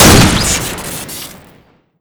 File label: scout revolver shoot crit; tf2